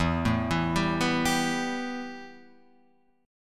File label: B 7th Suspended 4th Sharp 5th E